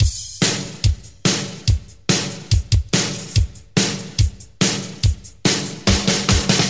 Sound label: 143 bpm old school breakbeat sample f sharp key